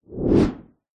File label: sound design whoosh short fast deep; zapsplat